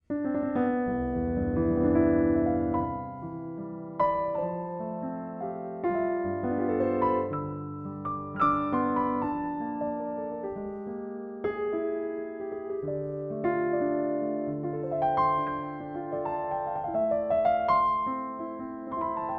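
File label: Gentle, Pianoteq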